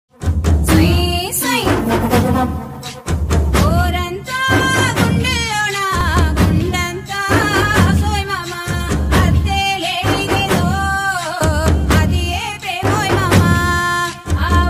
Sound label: song telugu; Gajaraju movie